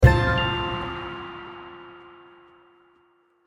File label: achievement completed; Might and Mayhem